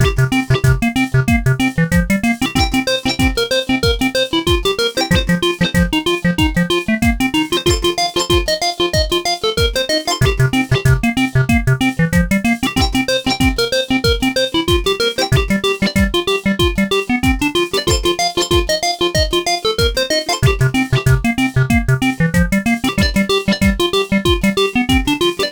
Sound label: 8-Bit Techno; chiptunes